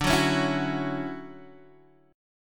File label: D-Major 9th, D, Guitar, Open G